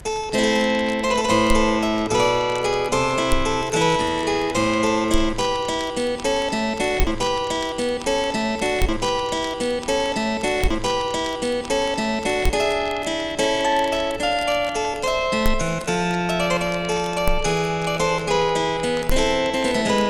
harp; antiquing

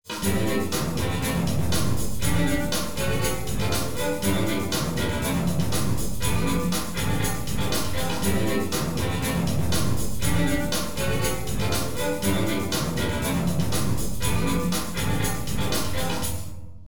groove small anchor

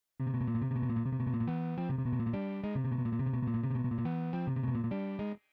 sine

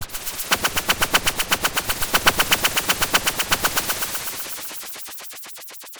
drum mix, 8Hz sine

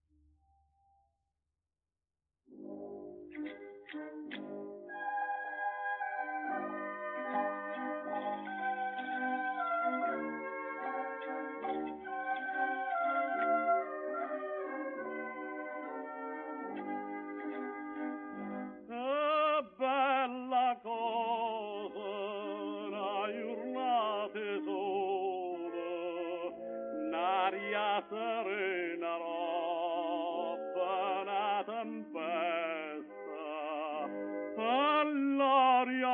O SOLE MIO, denoised; ENRICO CARUSO

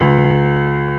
PIANO MEDIUM B1

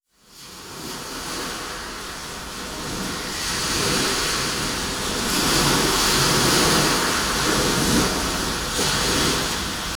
waves azimuth 90 spread 60 incoherent

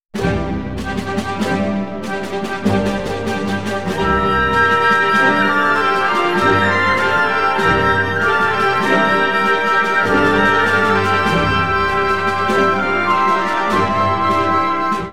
classical

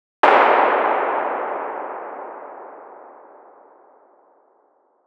Cembalo Body Model, harpsy

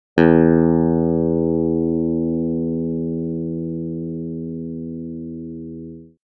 SYNTHETIC str6, electric guitar; electric guitar synthesis

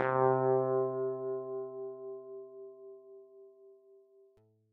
near string

